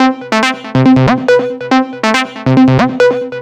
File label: NRG Lead, trance